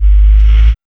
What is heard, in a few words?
sub FX; E-Lab; X Static Goldmine 1